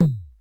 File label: Yamaha RM 50 Toms